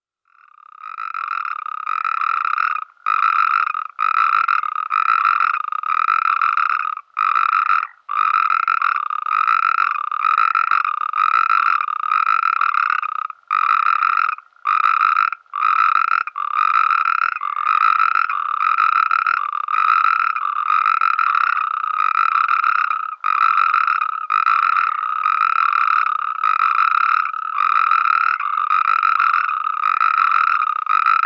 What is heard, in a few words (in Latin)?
Bufo Calamita